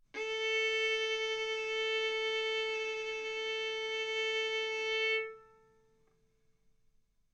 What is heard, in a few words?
Violin arco mf sul A A4 B4 stereo